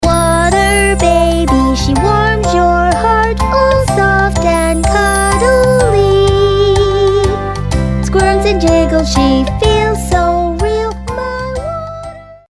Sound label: Jingle child, animation; Waterbabies